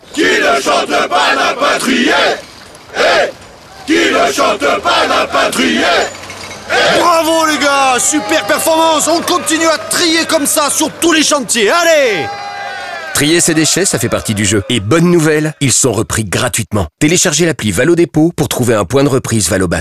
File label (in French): CHANT APPLI TRI DECHETS CHANTIER; VALOBAT